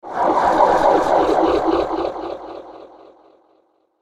Sewer Nightmare; Scary Soundscape Sound Effects; horror sound effect

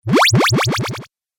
Game Time Warp; Video Game Sound Effects

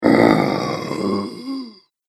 Zombie - Sleepy Time; Zomby Sound Effects; horror

